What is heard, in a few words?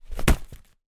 leisure toy inflatable hit object